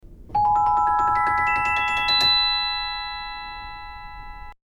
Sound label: celesta sms